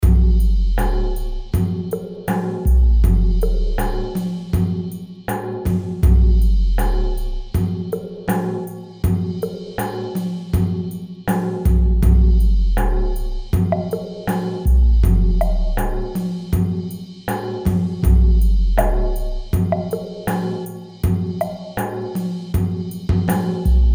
oriental groove

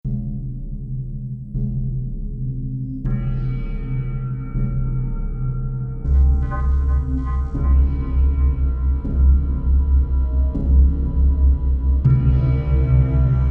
cinematic synthwave; re4orm

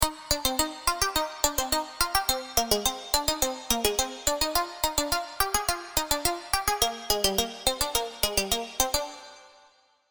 serum plucks; chiqui audio

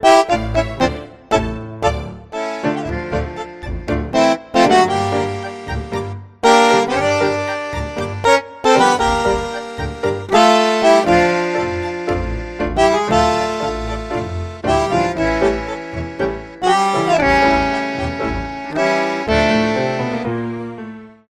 bandoneon

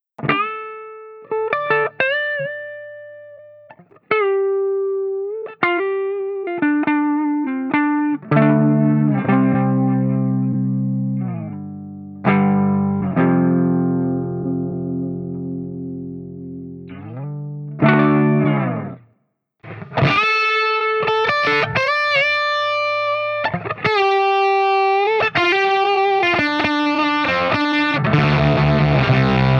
fuzzed guitar

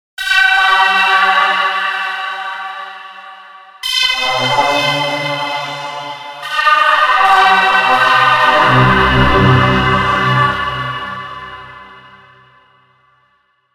sea pad

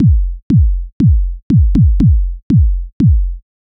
basic kickdrum 120 bpm

